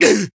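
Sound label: damaged, Japanese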